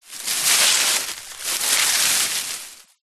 foley tree branch small leaves short movement drag ground